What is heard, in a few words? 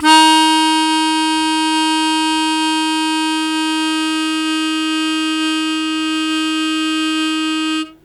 Long Sustain Eb1, Harmonica; Zero G - Harmonica